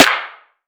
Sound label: crisp snare one shot g sharp key; snare drum samples; one shots